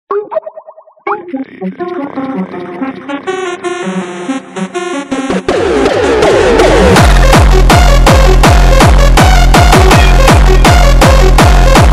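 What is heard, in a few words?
jumpstyle sped Up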